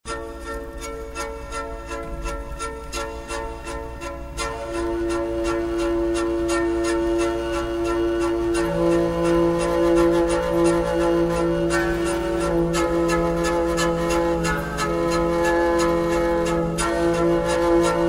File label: wheel harp